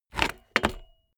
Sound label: bmx bike pedal grip double heavy; sport freizeit